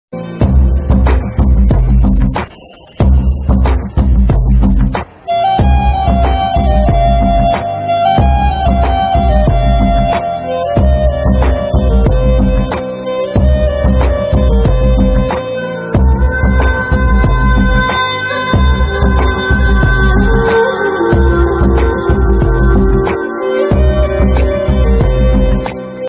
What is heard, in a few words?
movie bgm ringtone south movie instrumental ringtone; mar mitenge 2